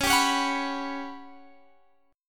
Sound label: G Major 7th Flat 5th C sharp